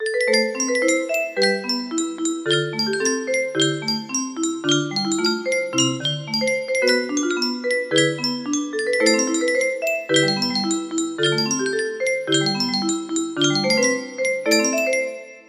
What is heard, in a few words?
melody, music box